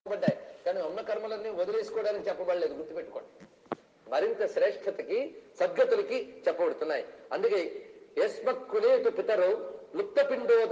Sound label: Gaya Mantra